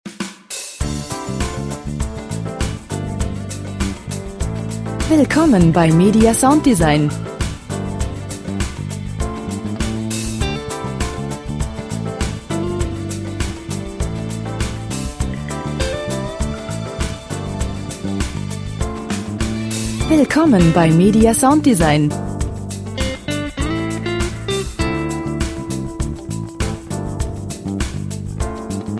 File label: groovy smoove groove